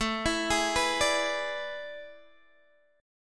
A Major 7th Suspended 2nd Suspended 4th, Guitar, Open D